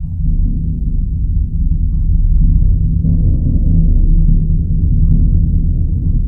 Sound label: BS DRM ROL; ILIO; Synclavier Percussion 2; World & Orchestral